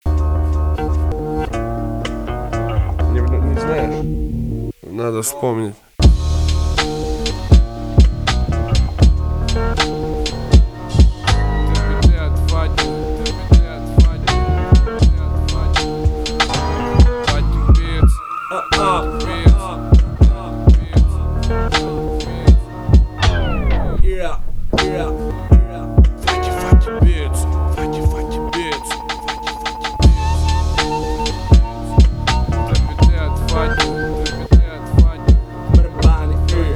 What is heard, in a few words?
Beats